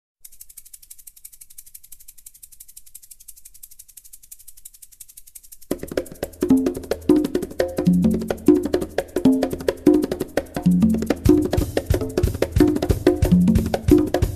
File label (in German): Hummelflug